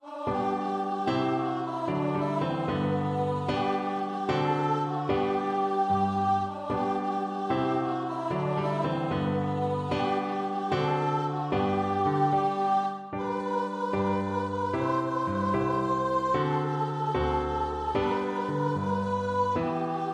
vive la compagnie VOICE, unison choir

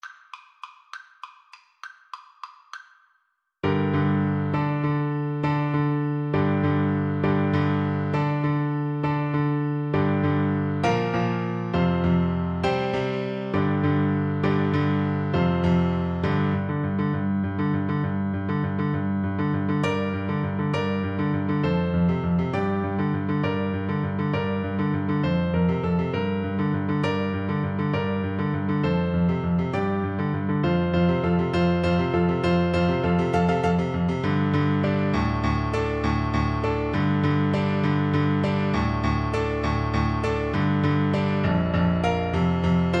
pirates, Bassoon

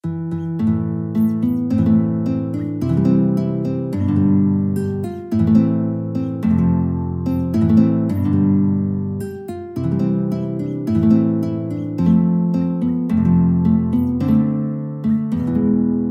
guitar

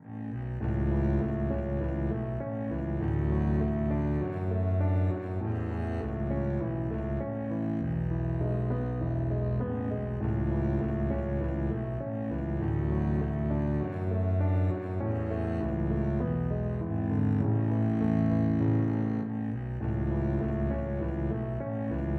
the possums tail is bare, double bass

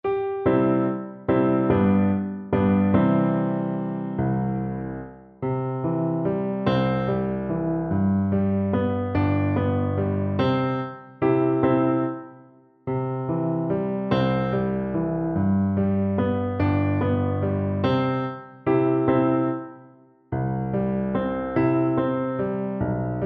es klappert die muhle FL kar, Flute

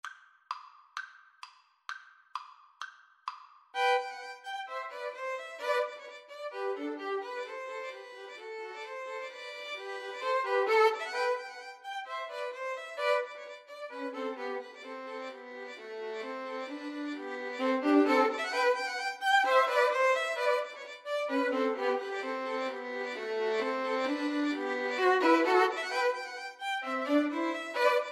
nutcracker trepak, 2 Violins Cello; Slow Playalong; Tchaikovsky, Pyotr Ilyich - Trepak from Nutcracker Suite, Op. 71a IV.

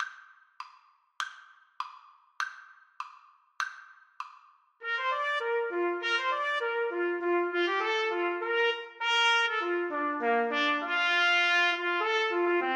grand old duke trumpet duet

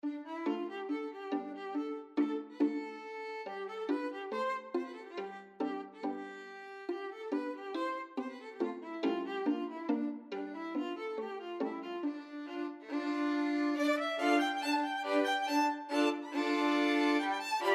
romanian folkdance, violin quartet; bartok

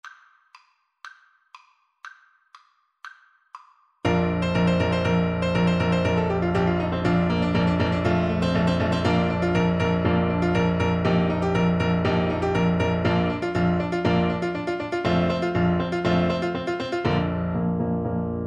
Capriccio Espagnol ASAX

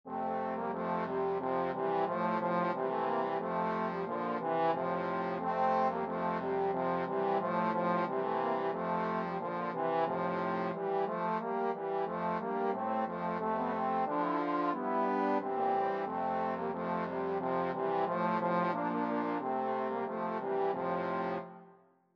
trombone quartet

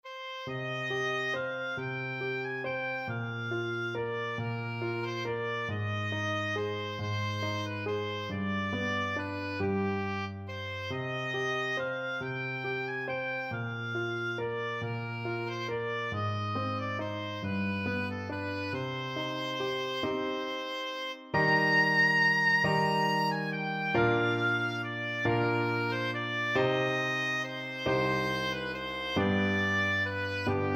greensleeves, oboe

